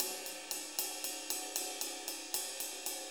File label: 6-8 Ride, HiHat & Ride Loops